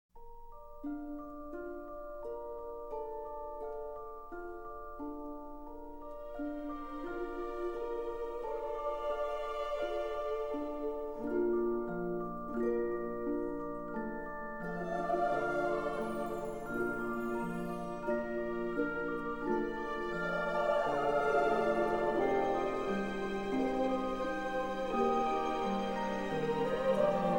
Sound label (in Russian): nach skazki, muzyka dlia nachala skazki